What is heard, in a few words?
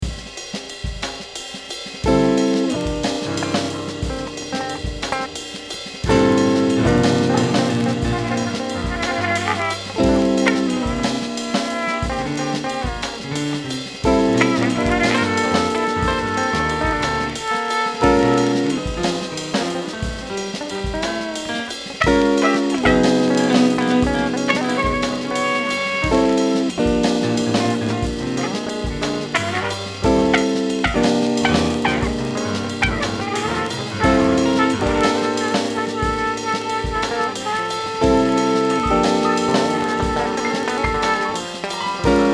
jazz